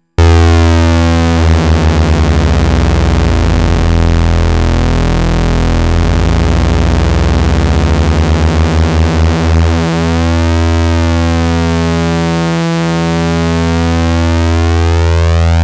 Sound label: phatt saw